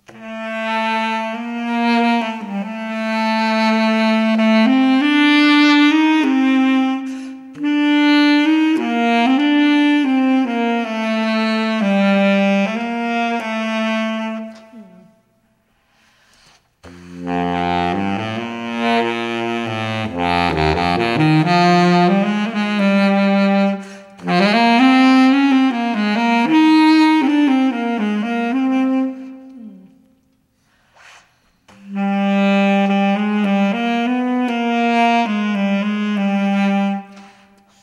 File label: sax solo